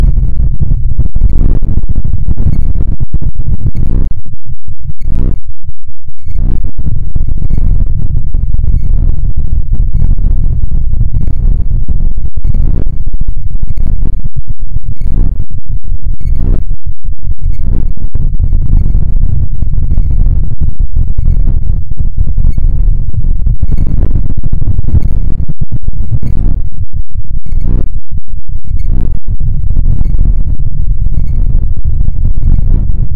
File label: oldschool noise; weeklybeats 2012